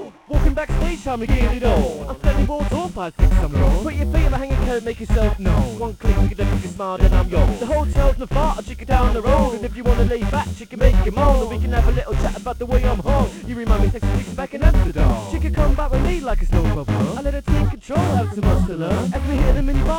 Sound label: harmonic